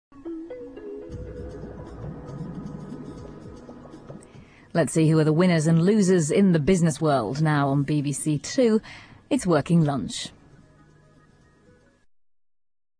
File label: jingle; bbc